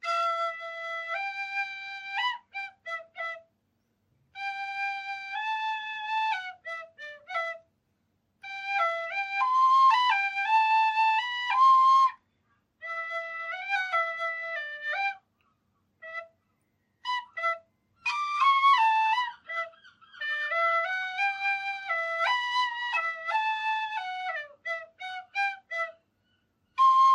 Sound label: Tin Whistle; Owl Bones